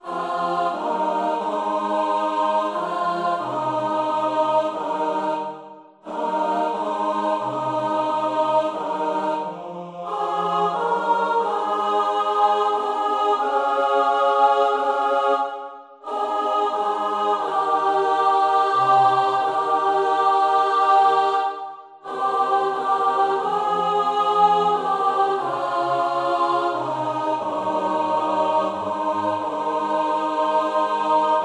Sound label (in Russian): Ночевала тучка золотая (Contralto resaltada)